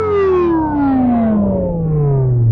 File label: Electric